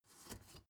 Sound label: step ladder wood scrape single